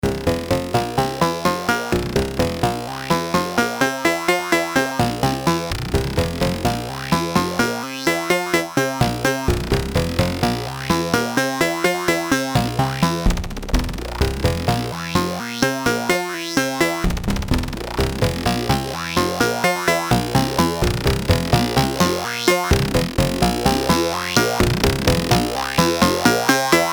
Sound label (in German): FM Sequenz; Behringer Proton